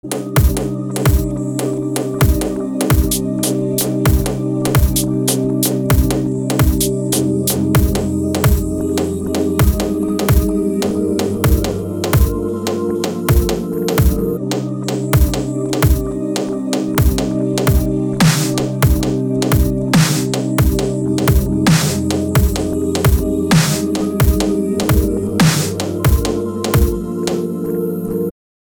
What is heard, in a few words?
Dub bit